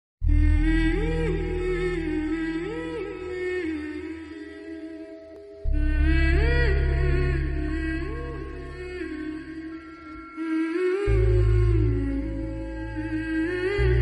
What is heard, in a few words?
telugu movie bgm ringtone; awara